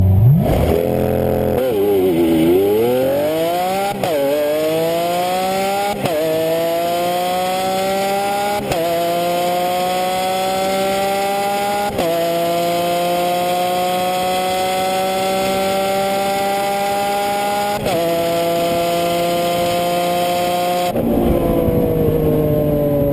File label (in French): Harmoniques